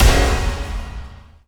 forest keeper scare boost; lethalcompany; zerosiki; slender

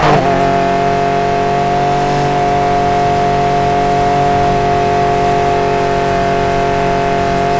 fourth, vehicles; fordrafa; nfs heat ganimator pack

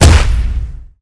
hurt; zombiesurvival; medieval raid; special mutation; arkantos

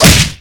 hit; slender; yakuza; kiryu

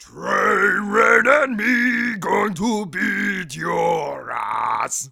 train rain; tf2ware ultimate

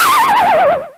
kart spin; tf2ware ultimate; mariokart

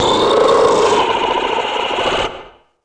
normal idle; floaters; slender

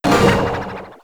hit; tf2ware ultimate

